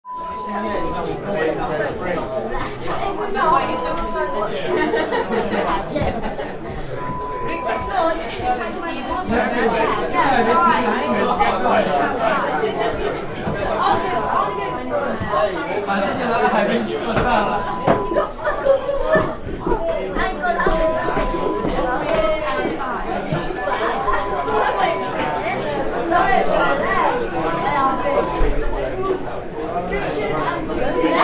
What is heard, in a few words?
Restaurant sound